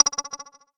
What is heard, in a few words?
multimedia rollover; sound effects one